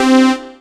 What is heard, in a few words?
dance chord